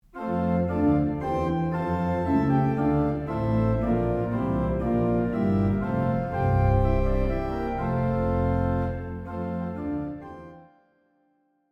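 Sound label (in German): Orgel, pfeifenorgeln; Peter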